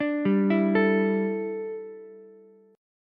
E Minor 11th Ukulele chord, C6; Em11 arpeggiated; JGuitar

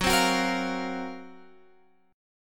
F sharp Major 7th Flat 5th, Guitar, Open F; F#M7b5 Chord; strummed

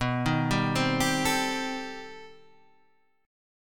B 7th Sharp 5th Flat 9th, guitar; B7#5b9 Chord {7 6 5 5 8 5} arpeggiated

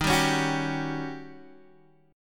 D sharp 7th Sharp 9th Guitar Standard; strummed; JGuitar

